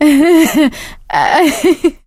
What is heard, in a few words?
laughter, survivor, voice